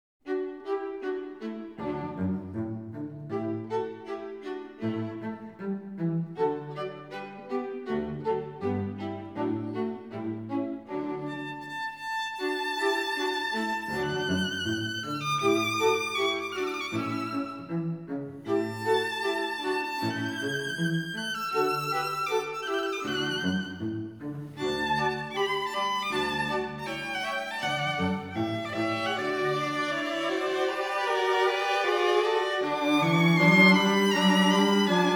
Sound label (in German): Streichquartett; Joseph Haydn Streichquartett No. 5; Nr. 5 in D-Dur Die Lerche; Allegro moderato